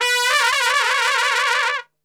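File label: B trumpet shake; Best Service; Brass Super Section; TRP SHAKE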